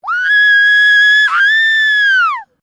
FEMALE SCREAM WOO HOO; Female vocal sounds; Screaming; Voice

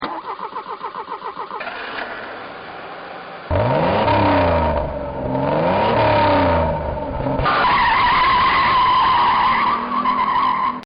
Porsche Starts Revs Drives, Cars; Vehicles

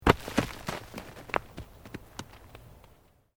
ROCK TUMBLING

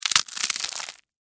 Texture, Crack, Crunch, Ice, Cream, Cone; Food; Household; Food Sound Effects

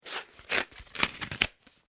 Cardboard tear; Material components for constructing more complex sounds

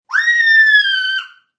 Child Scream, Child screams.; Screaming; Voice

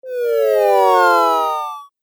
8 Bit Style Fall; Video Game Sound Effects

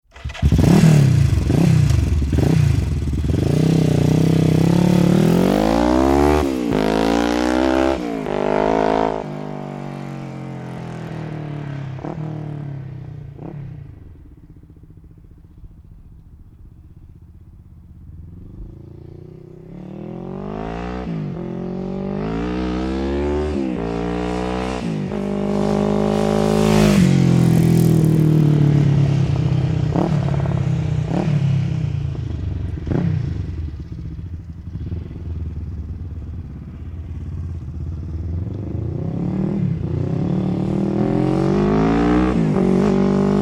Motorbike Ducati 350 Start and Away then Pass; Passes 5 times at various speeds; Motorcycle; Motorcycle Sound Effects

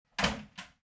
Door Close; Doors (Sound Effects:Science Fiction); This sound effect was recorded in a custom built sound booth

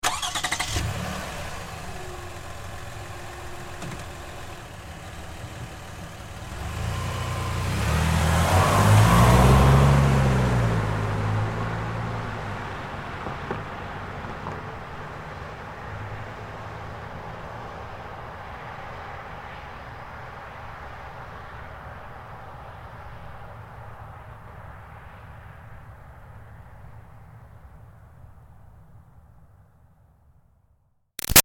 Car Highlander Start Away Med; 2005 Toyota Highlander V6; Cars; Vehicles; Big Room Sound

